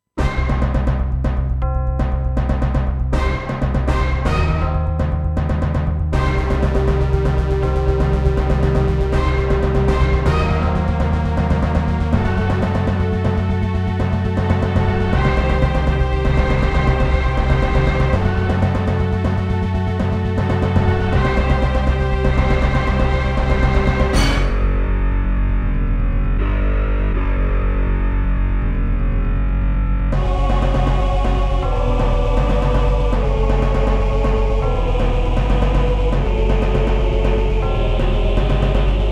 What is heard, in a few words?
SC880; Dune 2